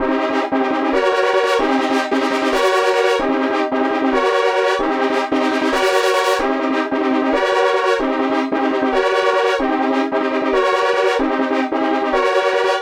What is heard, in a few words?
filter horns, 150; Masterbits - Tekkno Trax; SQ LOOP 150